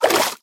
swim, liquid; ayuncraft-1.5

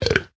burp; minecraft

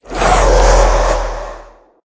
idle, wither; minecraft; mob

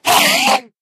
scream, endermen; ayuncraft-1.5

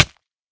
hit, damage; minecraft

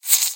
say, silverfish; ayuncraft-1.5; mob

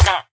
hit, villager; minecraft; mob

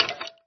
skeleton hurt

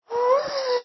moan, ghast; ayuncraft-1.5

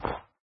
snow, step

